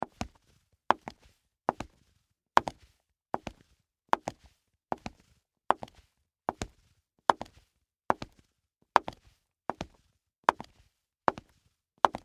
Leather Stiletto, Boots, Walk, Wood, Surface, Slow, Footsteps; BlastWave